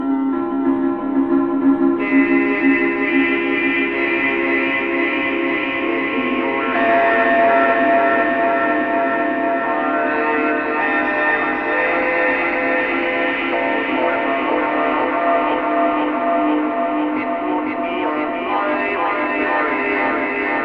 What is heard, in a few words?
Partygoer Ambience; backrooms 2d android ios online windows gamerip